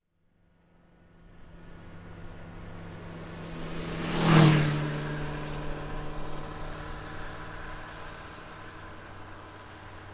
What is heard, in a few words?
Bike passing by fast and close